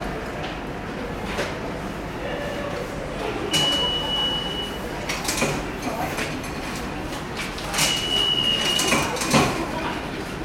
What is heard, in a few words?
ambience train station subway nyc ticket barrier; sound effects one